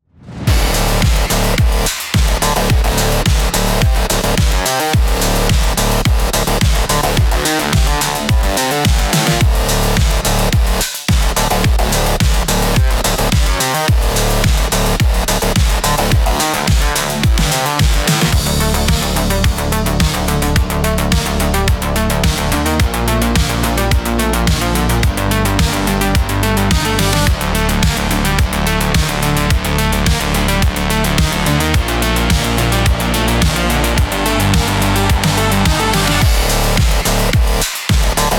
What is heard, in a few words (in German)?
Riot big start, schnell